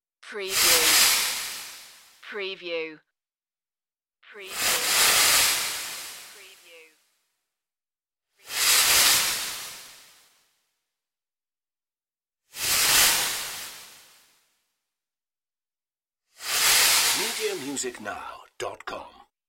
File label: witch transform swooshes